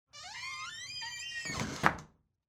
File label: Household Door Front Door Close Squeaky